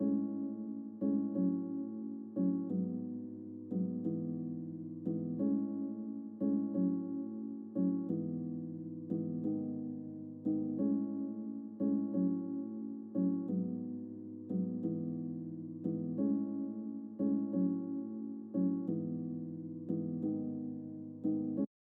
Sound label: Piano